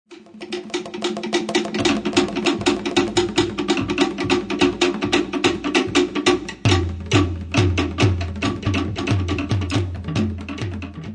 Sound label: muster tahiti; Sudseetanz